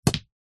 sport snooker pool junior cue strike cue ball hit other